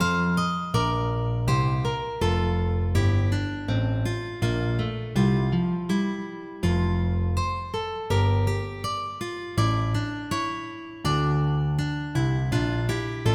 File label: Galliarda, MIDI